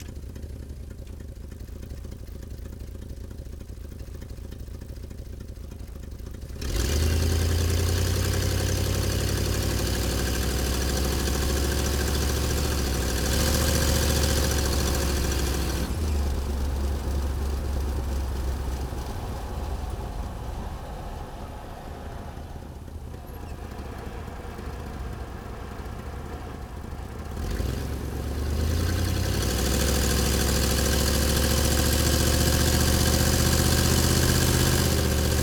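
AUTO Interior Onboard Drive Fast Idle Away Turn Stop Reverse Turn, 1964 Mercury Montclair; Secret Source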